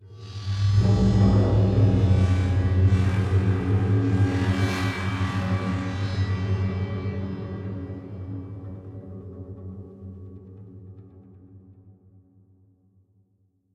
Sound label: Stinger Intensity; The Park